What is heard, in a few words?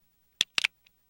357 Magnum Gun Cock